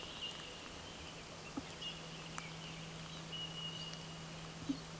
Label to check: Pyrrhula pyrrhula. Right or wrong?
Right